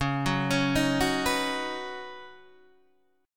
Db 7th Suspended 2nd Suspended 4th Db down Guitar Open D